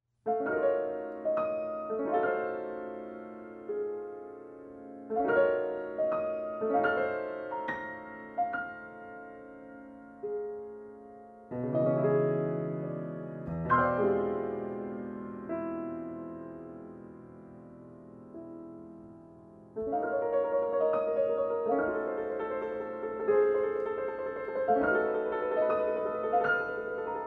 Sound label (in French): Poème I pour piano à quatre mains